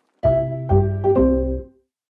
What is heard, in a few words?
Jingle; Canada